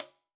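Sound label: Sab Closed Hat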